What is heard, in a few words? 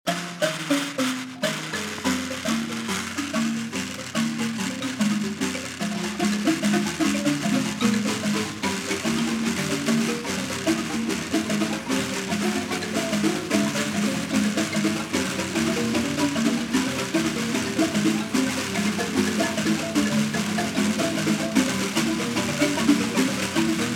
mbira